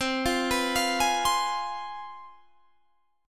C Major 7th Suspended 4th Sharp 5th, down, Guitar, Standard; CM7sus4#5 arpeggiated; JGuitar